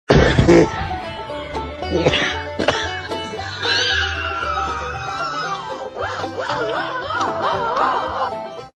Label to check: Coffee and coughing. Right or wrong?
Right